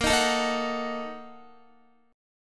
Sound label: A sharp Major 7th Suspended 4th Sharp 5th, Guitar; strummed; 4F,4A,5C,5G,5C,6E; JGuitar